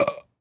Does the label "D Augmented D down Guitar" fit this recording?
No